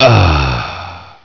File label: Ahh